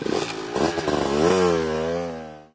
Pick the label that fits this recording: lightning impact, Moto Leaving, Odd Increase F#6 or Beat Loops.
Moto Leaving